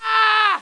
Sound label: yell; GEOGRAFIA